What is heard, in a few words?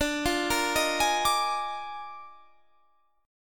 Eb Major 7th Suspended 2nd Suspended 4th D